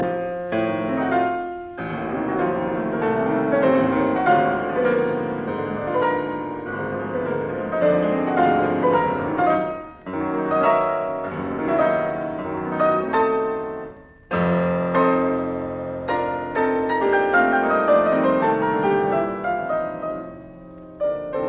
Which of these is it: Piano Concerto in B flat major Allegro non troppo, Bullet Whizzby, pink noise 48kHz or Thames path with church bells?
Piano Concerto in B flat major Allegro non troppo